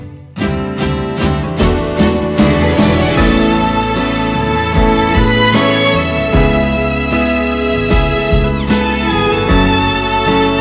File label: Instrumental